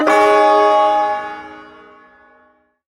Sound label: Ersatz Shawzin Yo Chord B; Warframe; Duviri